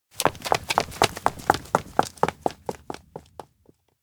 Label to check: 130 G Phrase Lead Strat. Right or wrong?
Wrong